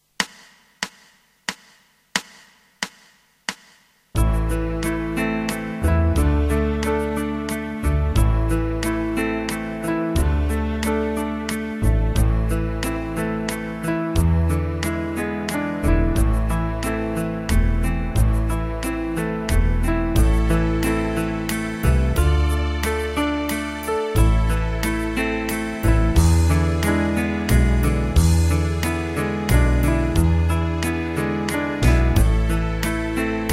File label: Lullaby, Guitar backing